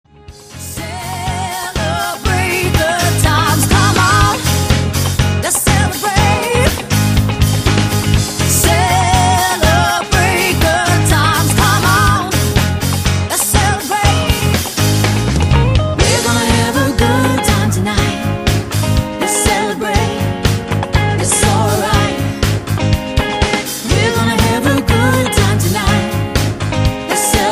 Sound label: Wedding and Corporate Covers Band